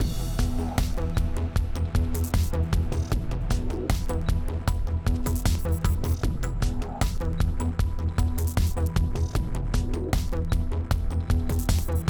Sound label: Gridnodes Anchor Combat 8CH 77BPM